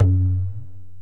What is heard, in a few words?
Korg x5, Drums